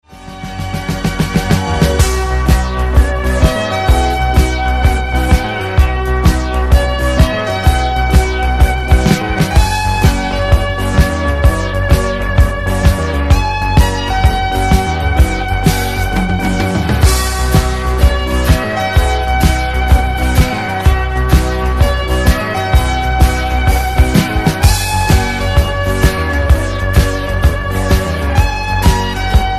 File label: Hover High